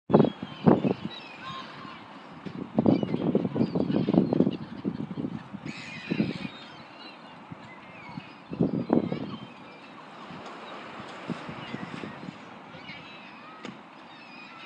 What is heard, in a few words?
sounds of bray harbour